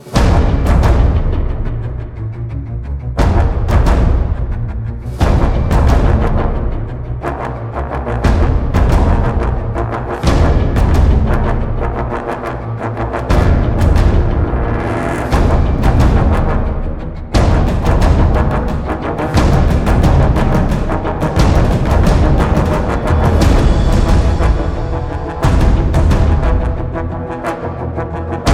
High Intensity Action Loop Full; battlefield bad company 2

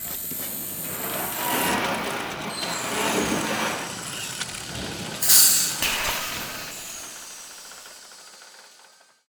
Car Compacting

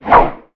Sword Attack swoosh; Fishmen

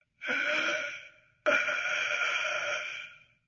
gameover; the necronomicon

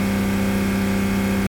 Car Engine I6 3l 4000 Rpm

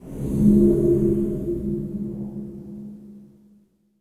swirl dark magic eerie

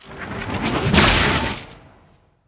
Dark Army Blazing Mage Destruct o ray

